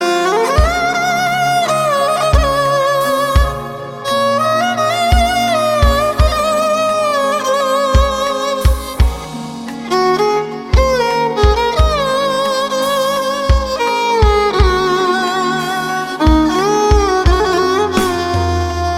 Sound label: Indian Music Mix